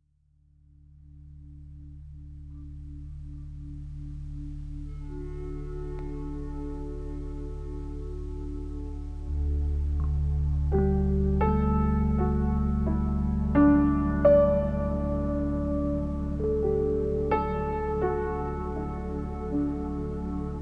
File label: Page Music